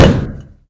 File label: Stone Plastic, collision sounds; clockwerk opensim